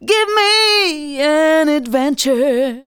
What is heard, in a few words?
vox, Techno Trance; Ueberschall